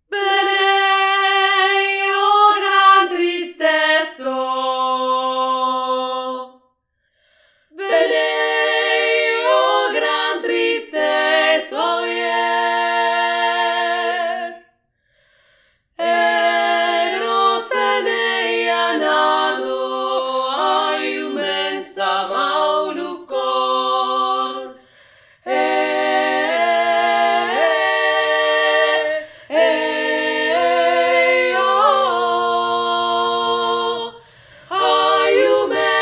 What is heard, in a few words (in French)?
3 VOIX